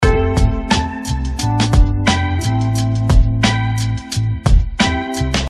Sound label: loop